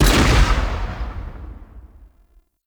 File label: Weapon Punch Long Rifle; Heavy Weapons